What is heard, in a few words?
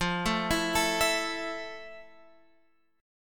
F Major 7th F, Guitar, Open F; arpeggiated